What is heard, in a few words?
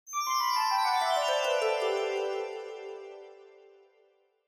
Scene Change Low Down Slow